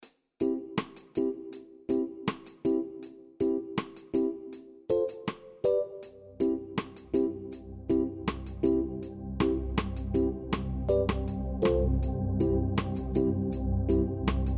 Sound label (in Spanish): Música Juego (Loop) - Base; Surface Rush